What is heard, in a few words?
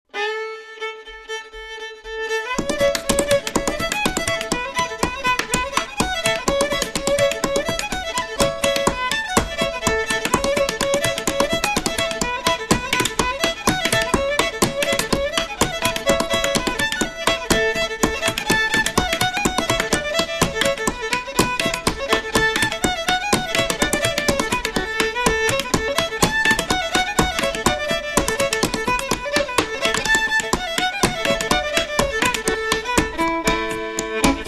fiddle; clogging; Bull At The Wagon